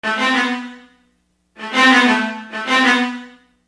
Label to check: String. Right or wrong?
Right